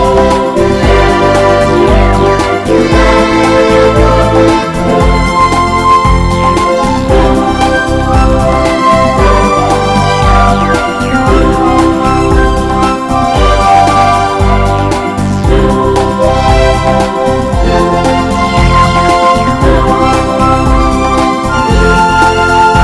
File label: Very Pretty Ballad